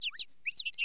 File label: exclam